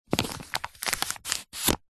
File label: money 20 dollar polymer note run through hands